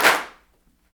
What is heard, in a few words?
CLAPS, Africa Handclaps